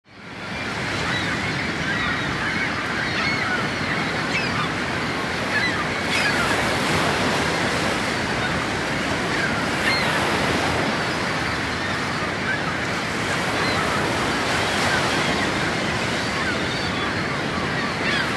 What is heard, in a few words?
Waves of the sea, ocean, seagulls